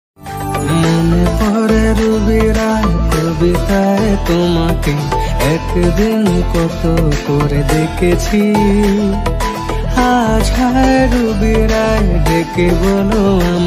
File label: Mix, bengali ringtone